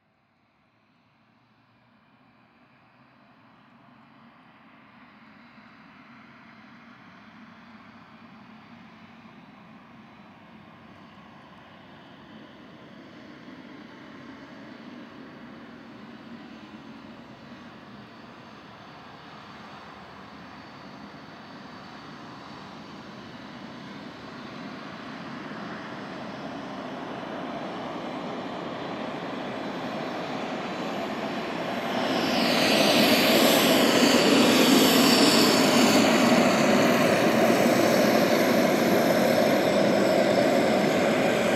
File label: Jet Taxi Drive By; Transportation Aircraft; Airplane